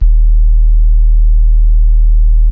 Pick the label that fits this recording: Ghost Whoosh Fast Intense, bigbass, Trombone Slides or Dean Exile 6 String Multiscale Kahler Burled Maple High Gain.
bigbass